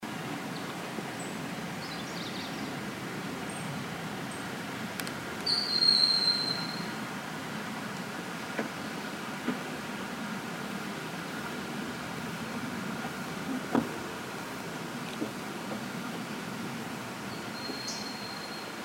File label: Broad winged Hawk Buteo platypterus